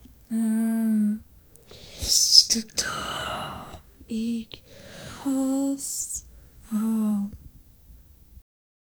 calm and caring, ambient

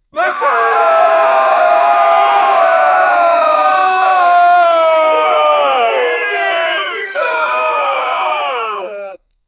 men yelling in battle in; historical

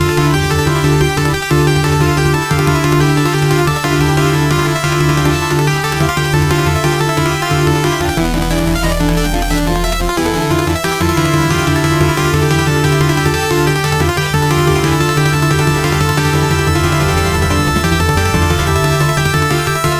chase videogame

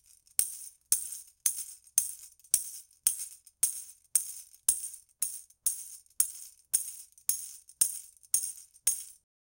tambourine, toy; baby einstein

